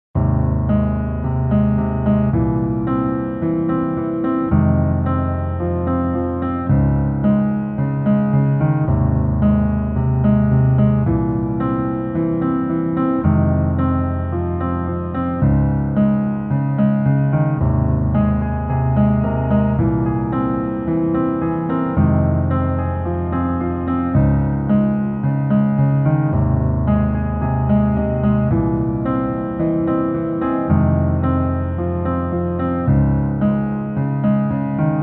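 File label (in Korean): Piano version 멜로디 MR 남자키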